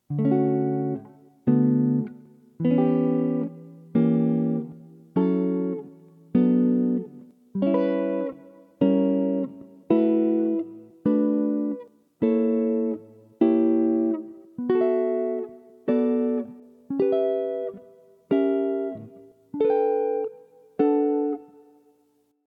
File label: Maj 6th and Dim Chords borrowing, jazz chords; Barry Harris harmonization